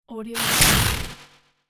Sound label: futuristic shot